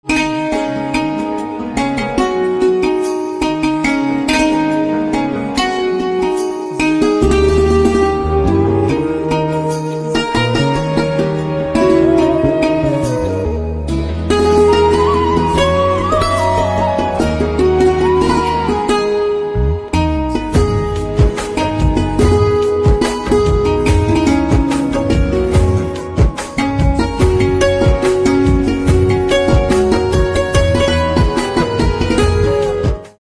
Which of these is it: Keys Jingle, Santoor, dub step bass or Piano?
Santoor